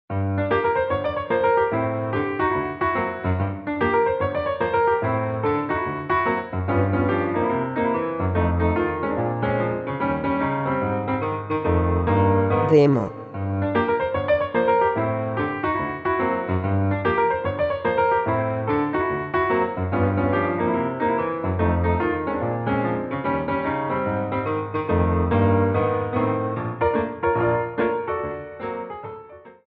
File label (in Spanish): Clasica